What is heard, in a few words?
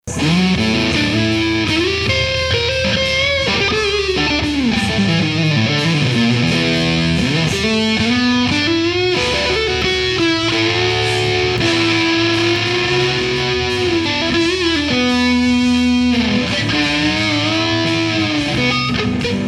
V4 Muff with Les Paul Neck Pup Marshal Max Muff Sustain